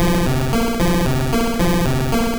Minimize

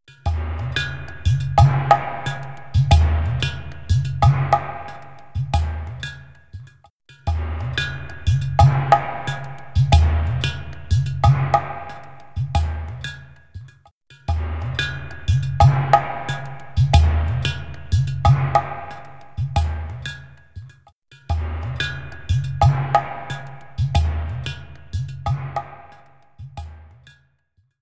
Drumming

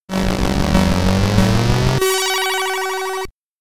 Jingle (SID Stereo); Championship Run

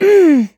voice female effort quick action; Infernal Radiation

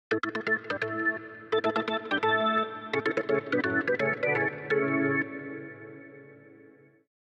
organ

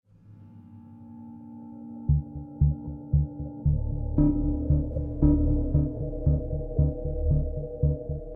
String; Assassin's Creed Unity Dead Kings

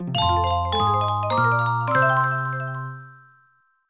Jingle Period Done

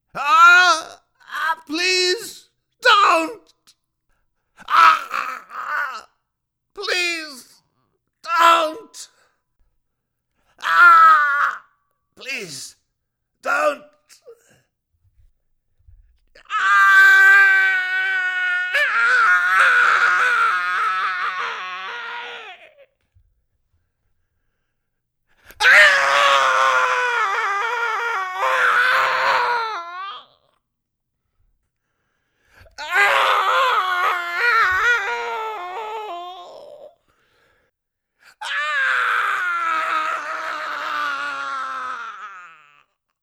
Bunch Of Screams; Lucius III

